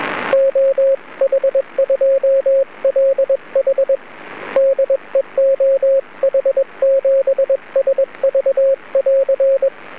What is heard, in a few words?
cw; juma tx1